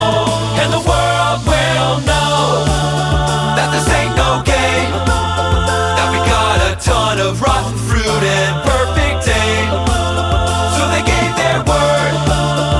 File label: medley a cappella; newsies